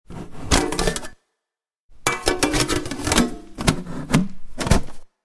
Tin Lid, Taking off and putting back on of tin lid.; Lids (Sound Effects:Objects)